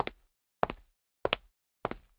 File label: Foot Step